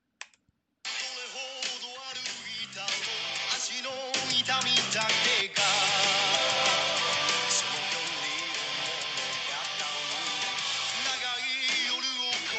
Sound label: music only